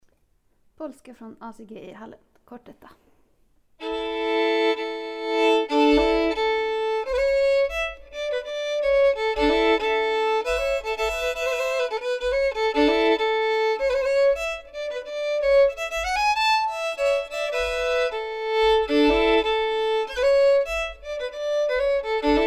Polska från Asige melodi

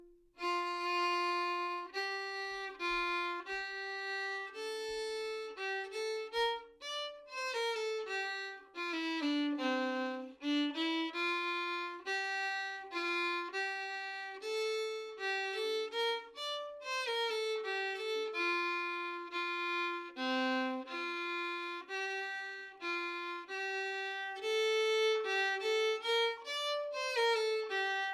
polska slow; efter Olof Andersson

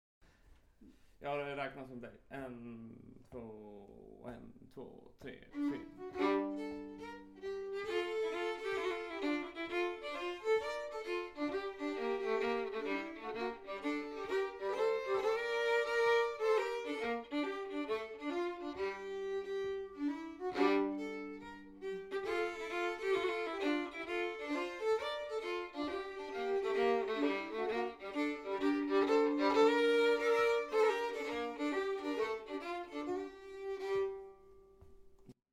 Schottis, stämma A del varation; från Norge

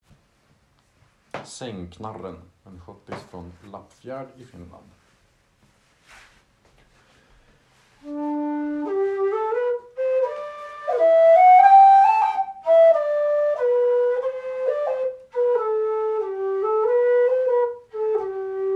langsam; Sangknarren

